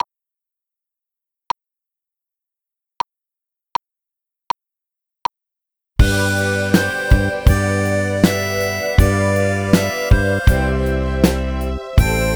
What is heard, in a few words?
Keys Drums